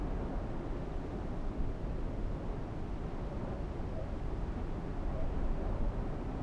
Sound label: BG Mist; blue archive